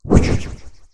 Dash

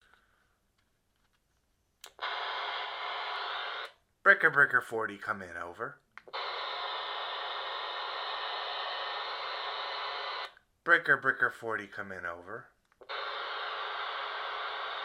Radio Test

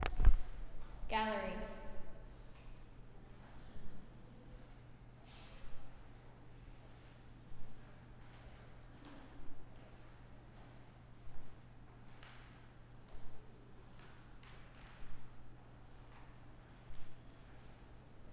Art Gallery Ambient

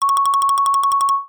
Cash

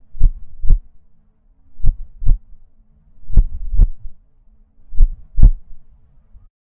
Heartbeat

